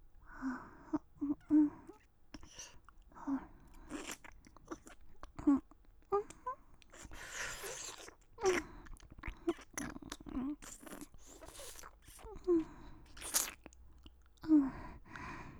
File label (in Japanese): 耳洗, 優しく